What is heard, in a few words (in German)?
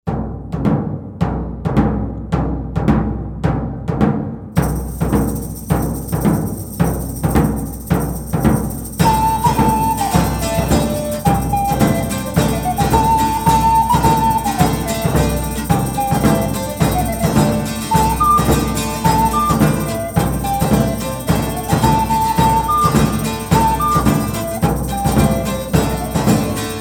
Bretonischer tanz